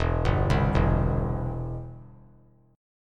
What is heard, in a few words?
E Minor Sharp 5th, chord; Em#5 arpeggiated; JGuitar